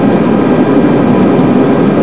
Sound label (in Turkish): helikoptermotor; tasitlar